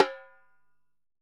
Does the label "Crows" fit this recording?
No